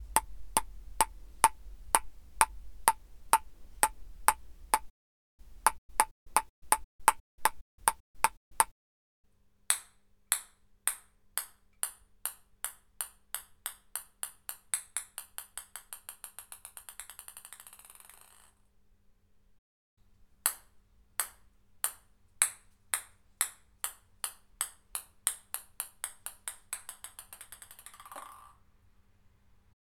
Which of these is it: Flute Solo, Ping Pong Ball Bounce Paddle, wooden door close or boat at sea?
Ping Pong Ball Bounce Paddle